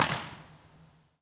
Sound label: Stanley Park Drive Underpass; Concrete, asphalt.